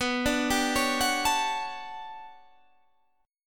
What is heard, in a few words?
G Major Sharp 11th B, chord